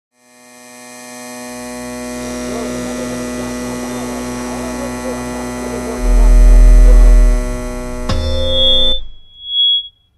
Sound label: Radio Transition